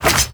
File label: Melee Block Start; Warframe; Wraith